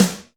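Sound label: Snr Deep Dry, snare; Alesis D4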